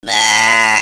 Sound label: burp; Beavis and Butt-head in Virtual Stupidity